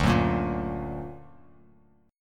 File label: D Augmented D down Bass Standard; D+ strummed; JGuitar